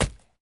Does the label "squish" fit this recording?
Yes